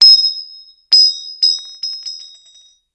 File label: Bullet Shell Drop On, shell casings fall